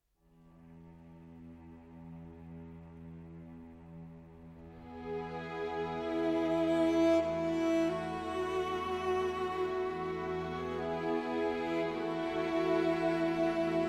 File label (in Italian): con cello